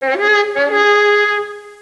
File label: HORN; Caesar III